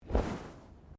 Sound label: Bodymovement Clothing Slide; Body movement foley to simulate falls and deaths; Dressing; Human